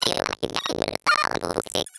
Vox, Circuitbend; 99Sounds; Mangling Audio

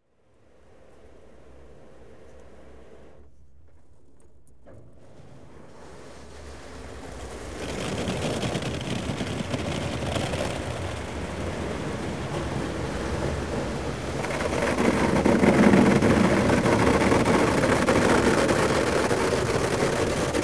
Car Wash; Rivers Streams Water; BBC Sound Effects Library

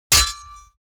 SWORD Hit Metal; Medieval; SmartSoundFX

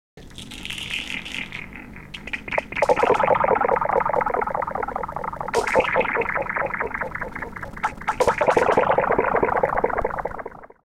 Textures; 99Sounds; The Weird Side Samples

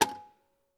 Old Metal Clock; 99Sounds; The Warehouse

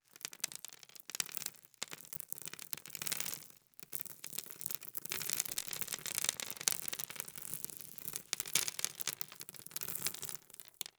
Grit, course, hard surface, dropping, scatter; Eiravaein Sound

